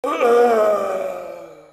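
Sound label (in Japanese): "うぁあああ", Japanese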